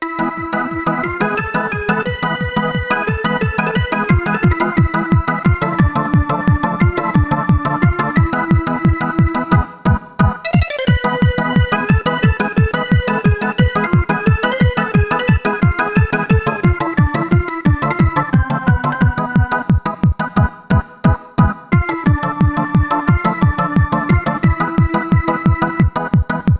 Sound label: arabian raves organ mix